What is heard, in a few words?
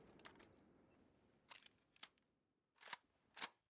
Rusty Reload; Zomboid; NewEkron